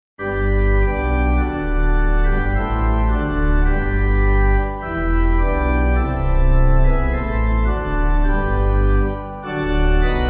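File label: Pipe Organ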